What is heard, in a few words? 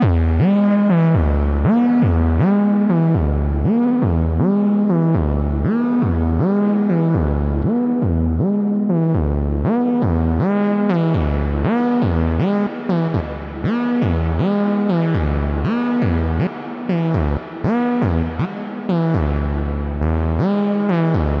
enigiser sequenced test